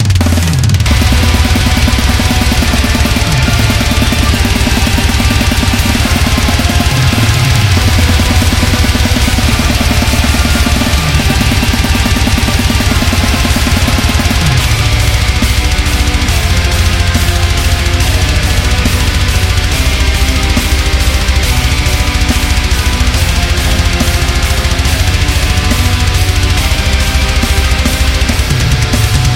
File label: Black Metal